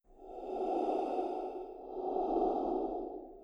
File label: breathing